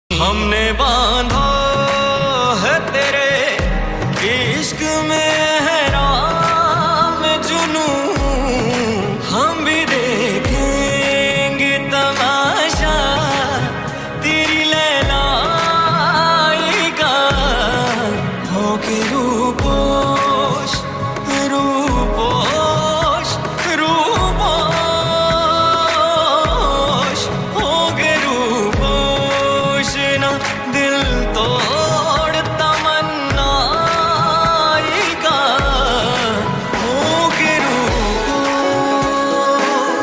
ringtone instrumental background music

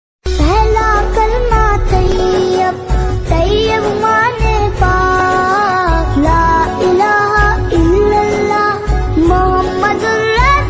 naat ringtone; pehla kalma; ramzan

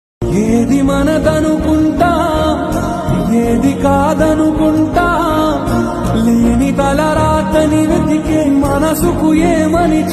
telugu songs music heart touching song; Mahesh Babu

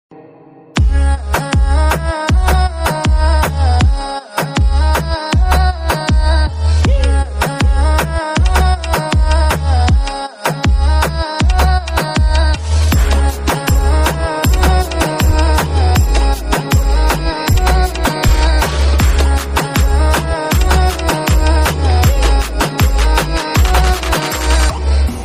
remix ringtone, bgm ringtone, english ringtone

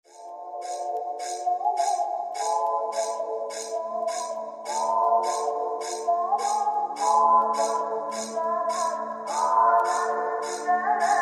attitude ringtone bgm english ringtone

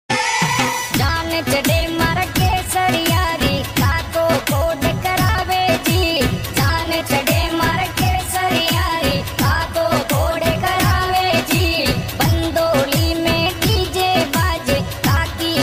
marwadi ringtone song rajasthani tune

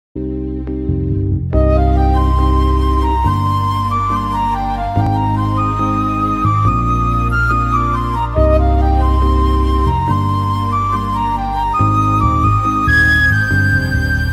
love bgm ringtone song bgm

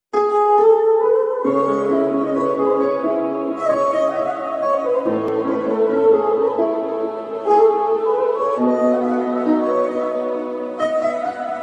beautiful islamic background music; jumma status

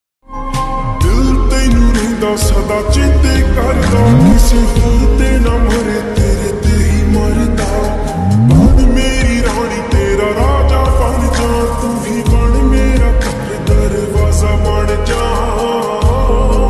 slowed and reverb lofi remix punjabi song

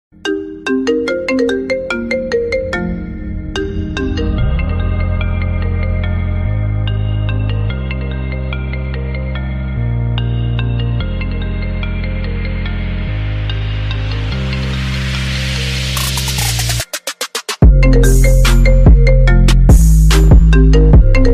iphone remix ringtone

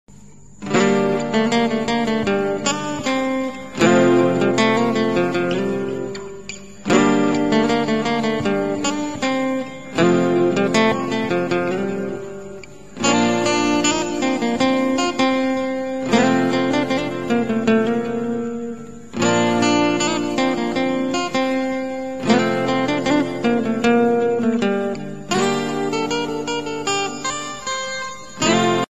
love theme instrumental ringtone guitar tone; mohabbatein

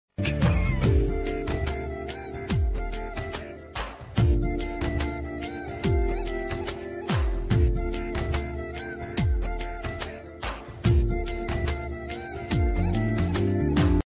instrumental song love bgm song

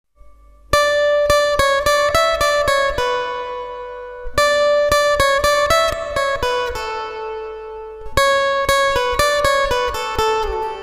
sad song old is gold Hindi instrumental music

